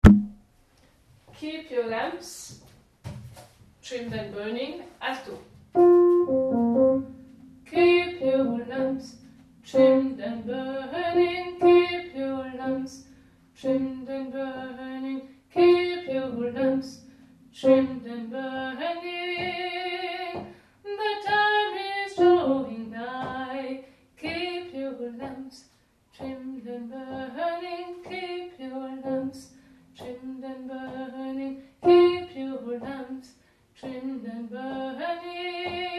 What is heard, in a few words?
keep your lamps alto